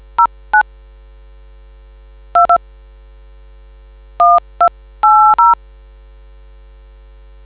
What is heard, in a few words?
dtmf